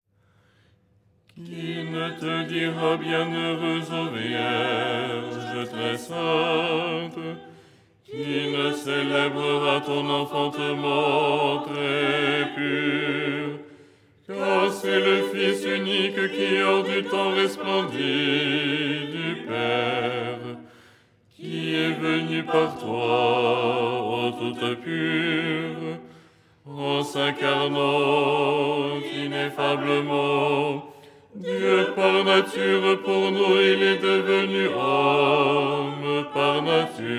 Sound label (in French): basse; Théotokion dogmatique; Les 8 tons en français; ton 6